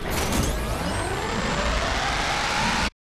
power up special; Titanfall 2

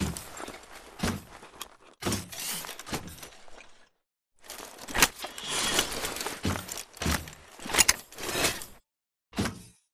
Victory Compassion; Coliseum; Titanfall 2

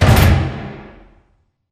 sliding doors open start; beacon; Titanfall 2

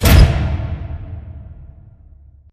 sliding door lg verb open start; beacon; Titanfall 2

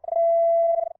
spider sense distant warn; burncard; Titanfall 2

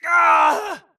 grunt death quick; Titanfall 2

